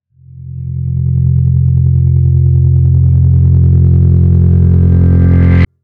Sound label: Bass Approach